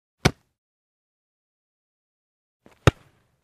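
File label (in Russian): футбол мяч ловят по мячу бьют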